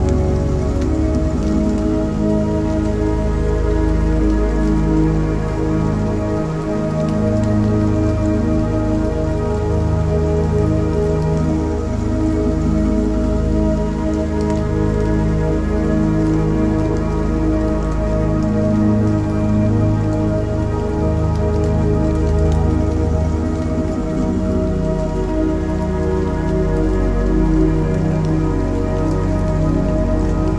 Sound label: meditation Zen Music